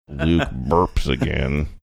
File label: burps again